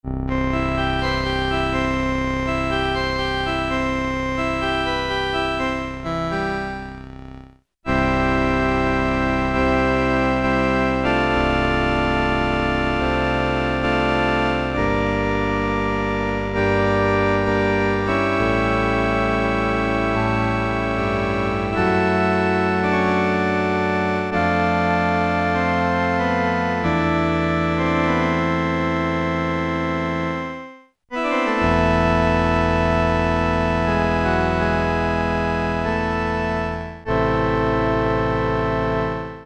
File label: Korg Poly-800, Pipe Organ